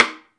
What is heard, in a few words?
STICK, Drum 1 Dry; East Collexion